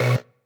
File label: marimba; ゆめ2っき